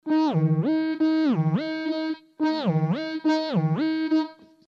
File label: Wh 1 Oct Dn, Pitch Shift; DigitechRP; RP200